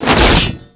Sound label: blast shield off; user interface